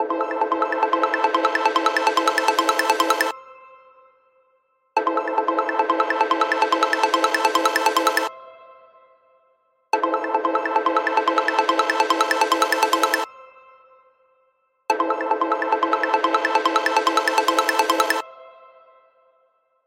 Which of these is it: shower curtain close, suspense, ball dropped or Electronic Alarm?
Electronic Alarm